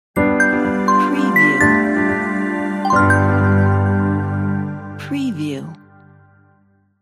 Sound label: simple clean logo